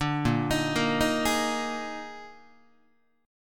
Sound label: Bb-11th-Bb-down-Guitar; Bb11 arpeggiated